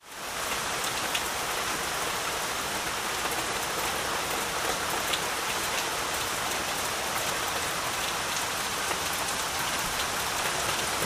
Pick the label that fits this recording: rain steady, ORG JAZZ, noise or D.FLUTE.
rain steady